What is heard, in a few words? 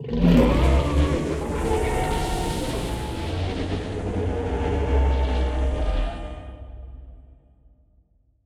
void worms troika death; Stellaris; grand archive; ships